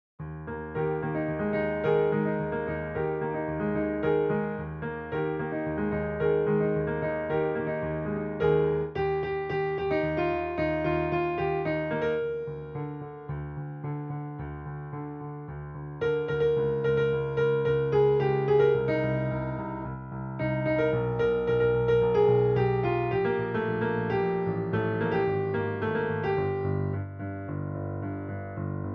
Under Separate Cover Melody

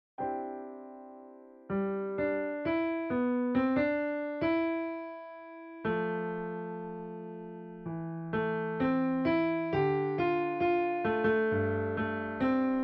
Melody

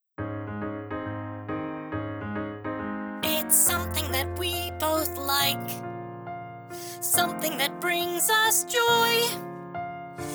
guide vocal HIGH

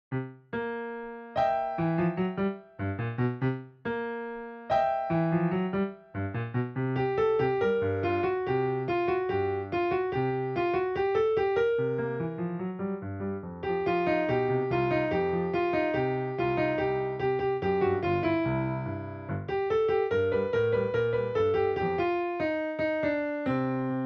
Boogie Woogie Melody

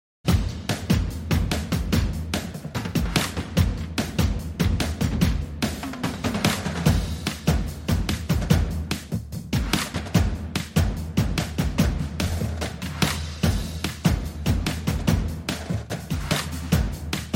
Villain March no vocals

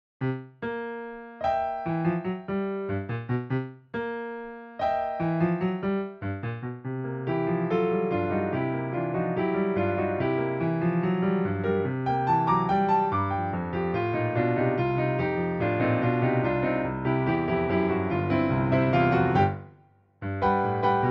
Boogie Woogie Accomp

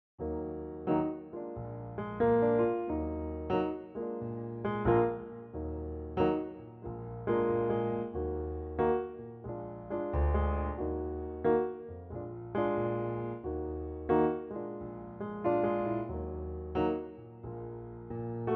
piano

